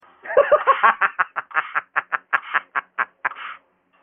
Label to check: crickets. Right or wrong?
Wrong